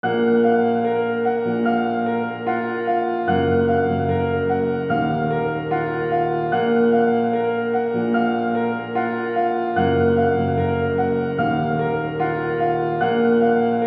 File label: Amin 148 BPM